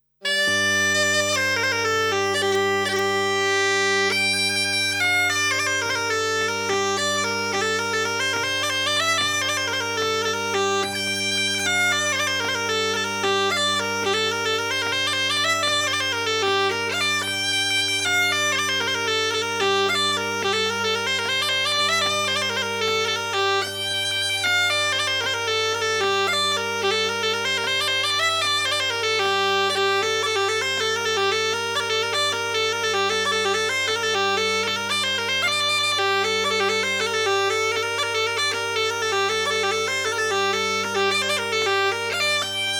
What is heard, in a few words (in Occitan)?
Borreia; Borreia de Dissendres